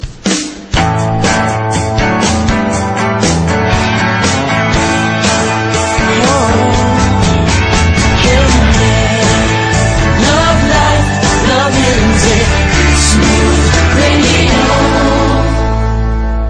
Smooth Fm

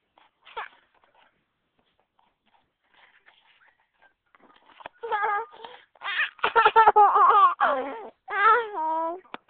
Baby laughing

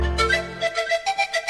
Flaut

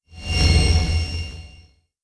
Respawn; Halo

